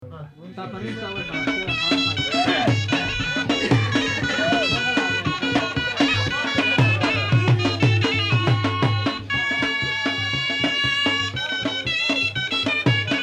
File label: Dance Tune, Afghanistan